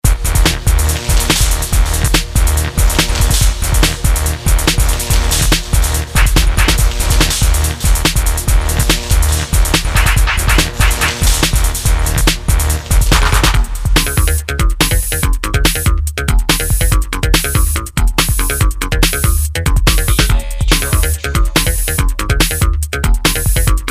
Electro Techno

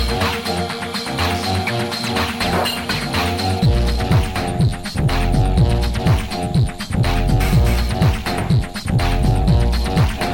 Crickets

